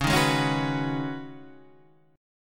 C sharp 7th Suspended 2nd Suspended 4th C sharp down; C#7sus2sus4; strummed; JGuitar